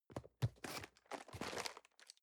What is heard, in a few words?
cardboard box medium turn soft; objekte